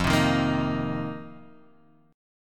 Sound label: C Minor 7th F; Cm7/F strummed; JGuitar